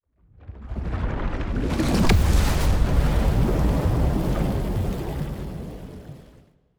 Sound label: colossus planet hit; aquatics